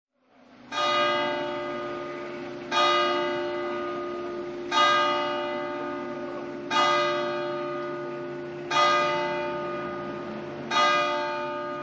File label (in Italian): grado ore, campane